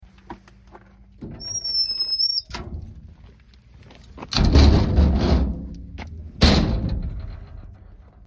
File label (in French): SORTIE PORTAIL; LOUBIGNE (79); BRUITS DE PORTAILS